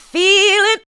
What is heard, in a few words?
ADLIBS